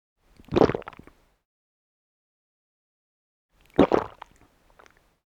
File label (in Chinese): 吞噬